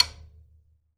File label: FTOM TAP, drum